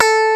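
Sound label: slap bass; Timidity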